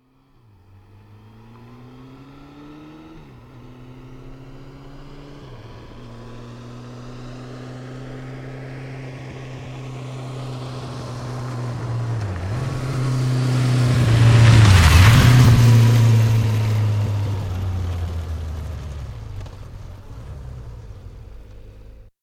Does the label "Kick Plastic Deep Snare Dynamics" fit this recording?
No